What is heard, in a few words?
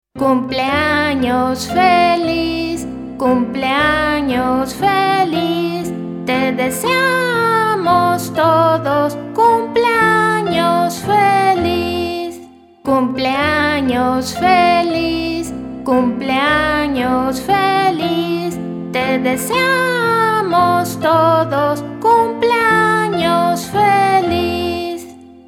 happy birthday, feestliedjes; Happy Birthday uit Spanje